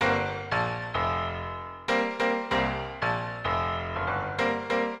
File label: PIANO 96 G; old school